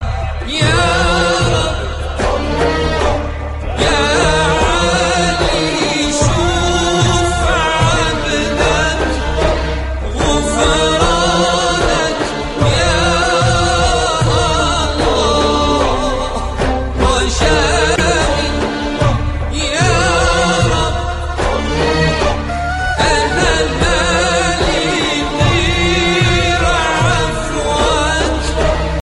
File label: islamic ringtone; islamic ringtones